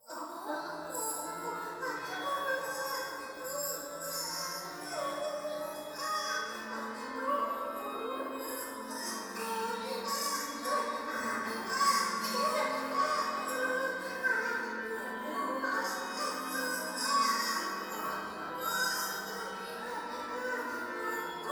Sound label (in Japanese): トイレ 低音喘ぎ 讃美歌